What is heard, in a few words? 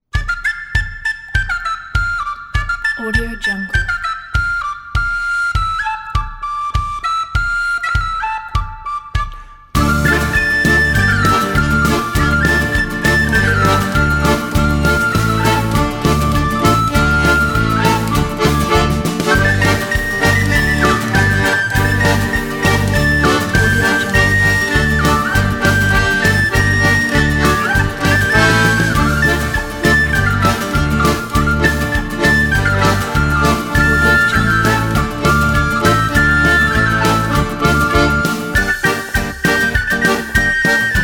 ukrainian folk